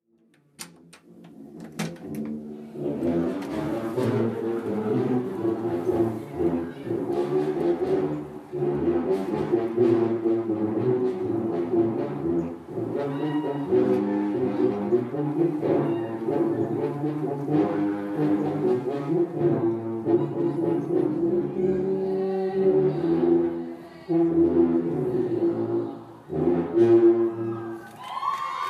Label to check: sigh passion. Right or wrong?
Wrong